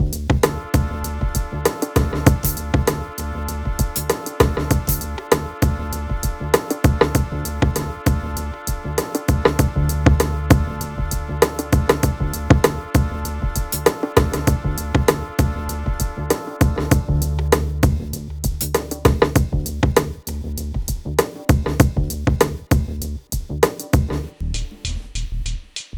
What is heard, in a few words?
Vintage Vibes